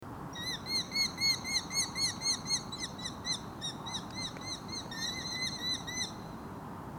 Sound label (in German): Baumfalke; Landshut LA; Susanne Rieck